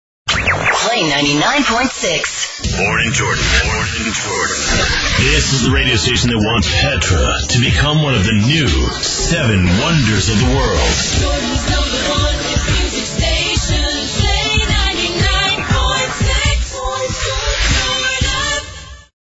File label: interval signal; Radio Station; jor-play99p6